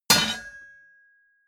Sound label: hammer hit metal